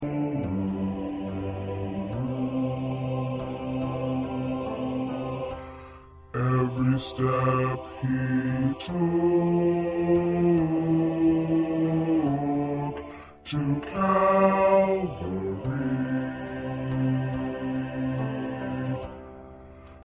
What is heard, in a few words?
Bass